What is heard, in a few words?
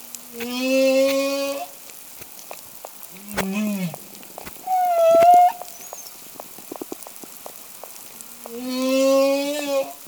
whale sounds